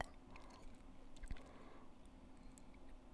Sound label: saliva